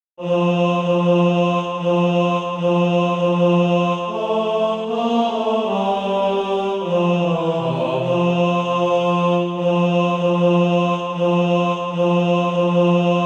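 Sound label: bass, Practise Music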